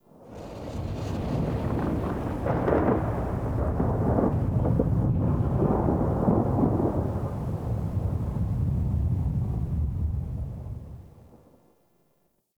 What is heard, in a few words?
Exterior Rolling Thunder